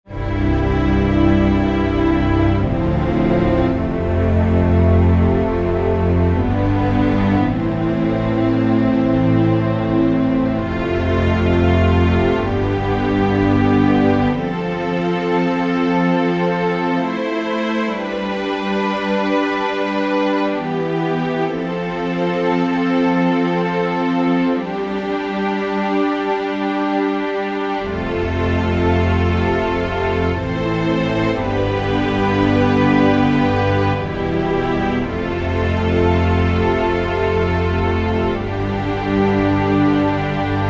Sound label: Underscore PAD; Worship Flow